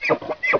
ELK, animal; 动物

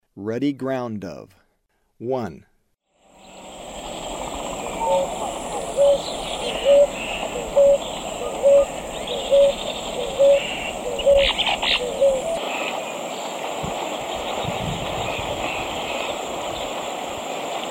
Ruddy Ground-Dove; S. America; Eastern Lowlands

